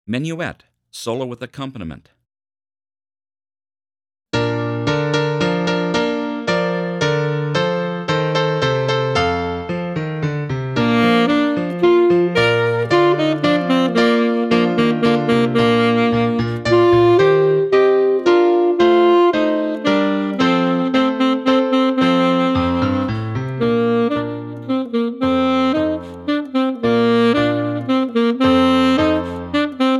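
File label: Menuett Bari Full, Bari Sax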